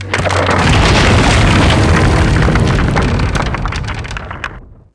destroyed bridge; DAoC; Toa

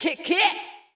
VOICE GRL HOUSE 125, House Voice; eJay

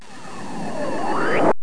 FLIP1

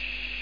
Refill Loop

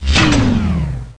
logoff

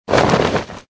Pick Up Heavy Tarp; Survivor The Interactive Game

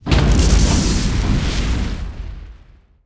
arm hit; StarSiege

